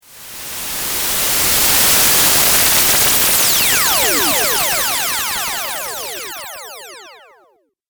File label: Teleport Warp Video Game